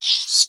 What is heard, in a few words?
rat squeek